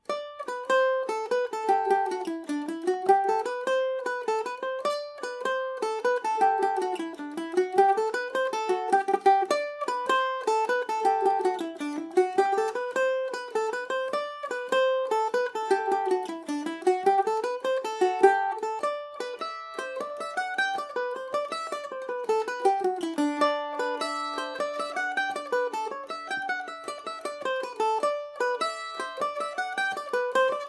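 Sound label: G major falder, irish mandolin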